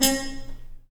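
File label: brass trumpet mute stab, Harmon stabs; Harmon Amb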